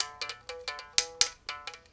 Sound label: mouth bow, Africa; Roland LCDP11 Africa VOL-1; S.MouthB Phr